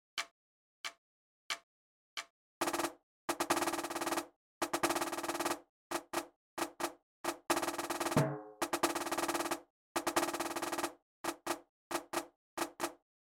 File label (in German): Locken Trommel